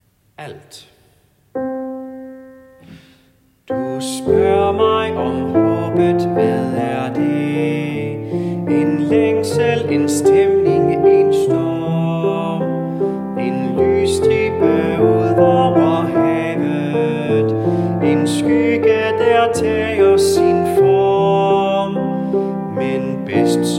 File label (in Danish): alt, kirkens folkekor; laudate kirkens folkekor